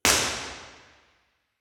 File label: IR RecitalHall Live, room survey; Messiah College; B-Format